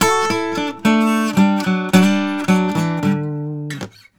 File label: Blues Guitar; ILIO; Fretworks